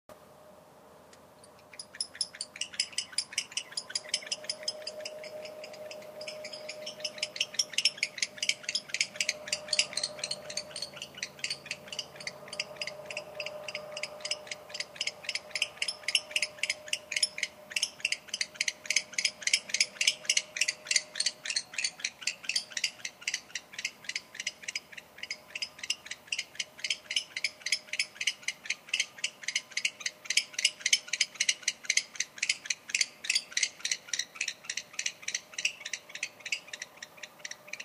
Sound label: Barn Owl Twitter